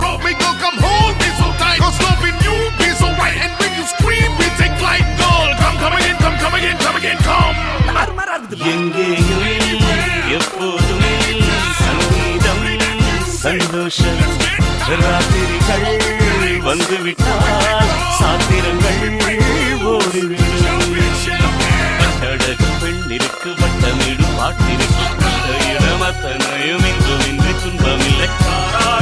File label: Rock